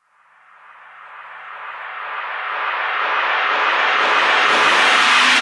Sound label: FX Uplifter